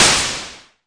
mbrute noiz, Arturia MiniBrute

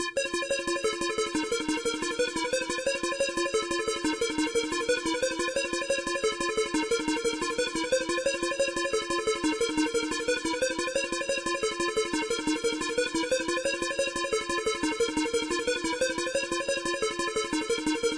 synth; On A Roll

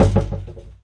five hit lubdub kick; Kicks; single hits

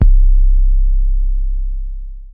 percusso sub, basses

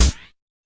fx drum

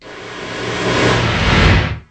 noise wave; Weird and Interesting Hits; single hits

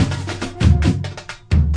135 paradinha big drums

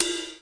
Cy Brush kit, Electronic Jazz Kit; STYLE KITS (Hip Hop, Etc)

